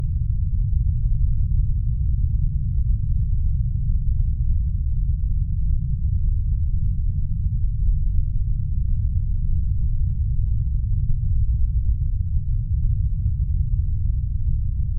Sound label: ambient background sound